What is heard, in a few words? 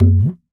Dhol; Roland BK-5; Percussion; Dholak